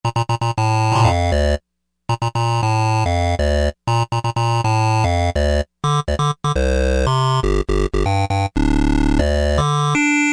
JU2 Wave 2; Roland SRX-05 Supreme Dance

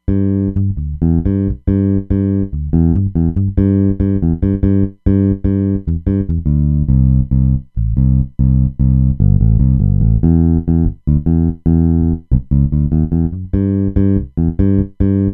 Roland Fantom XR

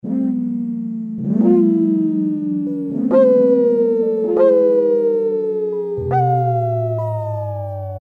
Yamaha TG33; SE-Wolvs

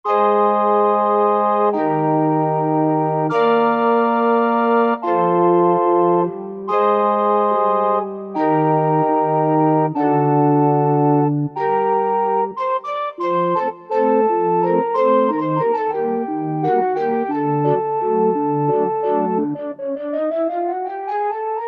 Piper Choir

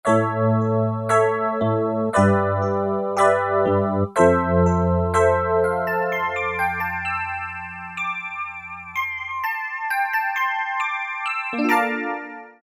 VZ EP, Casio VZ-10M; Internal factory combi

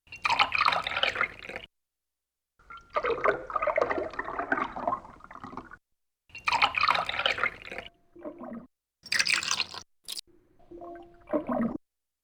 pouring, Yamaha TX16W; Sound FX

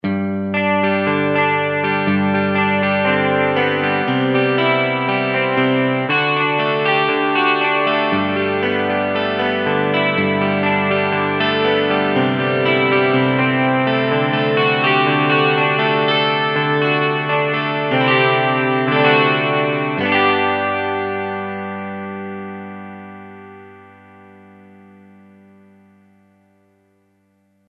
Elect 12 String, Kurzweil K2000; Kurzweil K2000 Contemporary ROM

